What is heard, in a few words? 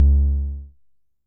tom, Coron Drum-Synce DS-7